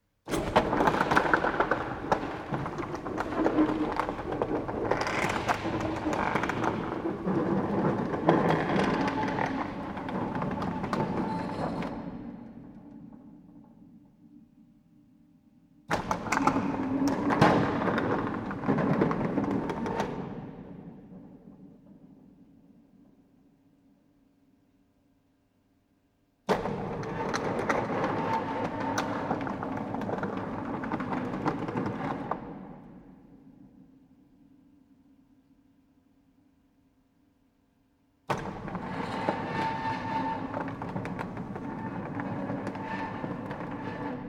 Industrial Mechanical Electric Door Large Close Perspective Open Variations; Metal Door